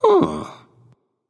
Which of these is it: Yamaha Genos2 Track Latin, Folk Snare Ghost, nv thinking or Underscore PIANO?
nv thinking